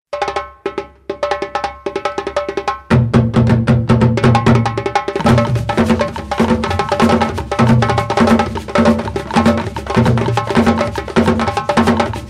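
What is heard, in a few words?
Dundun, Djemben, Shekere, Darabuka, Talking Drum; Trad. Senegal; Timeline: Afro Clave 3 / 2; Djambadon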